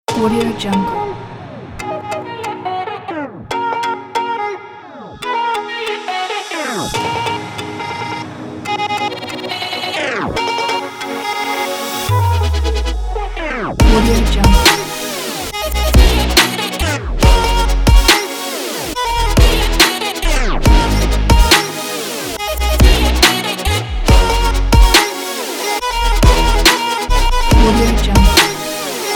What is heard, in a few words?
vocal chops future bass